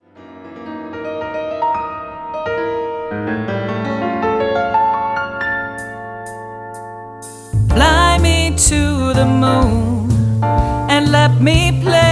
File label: jazz